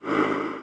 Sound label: Tv